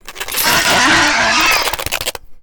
Spider Trap Activate; Evolve; Gorgon

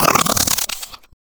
Exertion Heavy; Evolve; Kraken; Grounder3

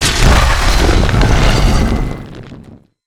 Charge Attack Vox FLAME; Evolve; Meteor Goliath

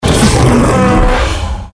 dragon old hit; Jaminporlants

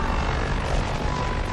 beam loop

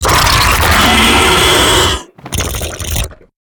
Death; Evolve; Gorgon; Lurker

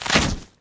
Web Shot; Lurker; Evolve; Gorgon

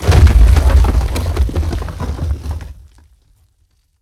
Rock Prison Collapse; Evolve; Behemoth

